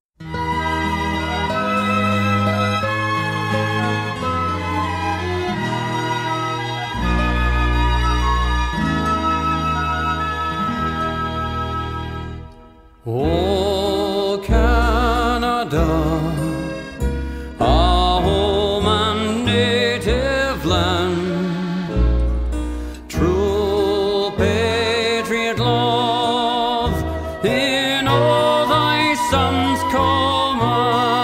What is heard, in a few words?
o canada canadian national anthem